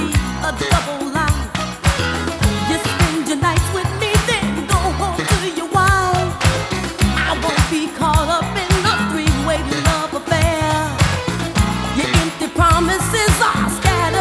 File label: disco